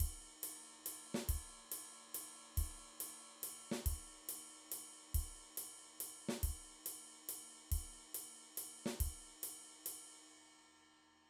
Groove 68 Drum Beats

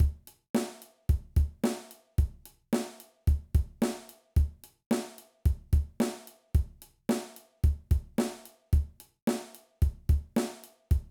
Straight Beat, drum

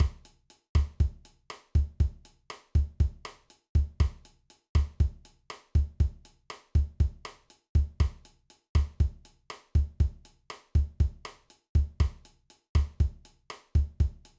Bossa Nova Beat, drum

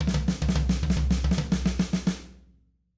Fill, drum

